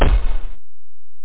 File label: swarm split; sdlroids-1.3.2; SDL-Amiga